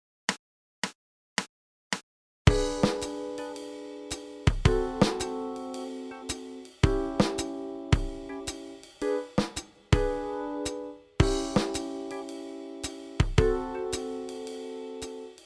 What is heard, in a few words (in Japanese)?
ウォーキング･ラインを取り入れたおしゃれブルース･フレーズ